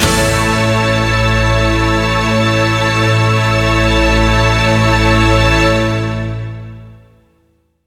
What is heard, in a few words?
swell, String